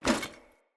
Foley Action Armour Melee Swipe; Player; Deora Armour

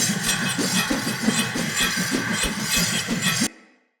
Sword Throw Loop; Weapons; Player; Swords; Fenn Jotar Sword

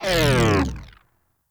Proj Small Fire; Warframe; Lizzie

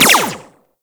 Sentinel Burst Laser Pistol Fire; Warframe

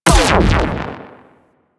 Railgun Fire; Warframe; Corpus; Corpus Railjack; Biped Railgun

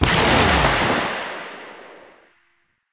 Shot Alt, Rifle; Warframe; Weapons; Thanotech; Trumna Prime

